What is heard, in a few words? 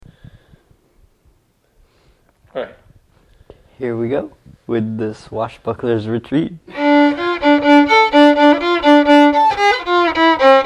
Violin